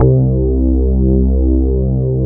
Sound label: P800 PCPY C2, Korg POLY800; Back In Time Records; Korg Universe VOL-1